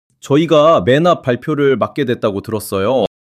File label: surprise